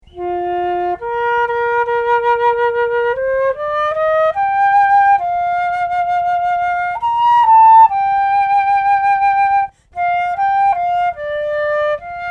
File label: flute